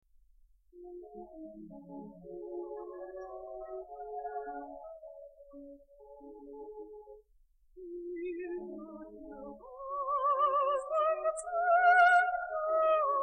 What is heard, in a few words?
air